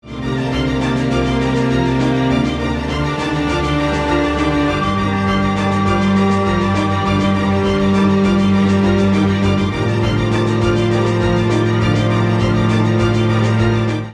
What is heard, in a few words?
newage neoclassical cinematic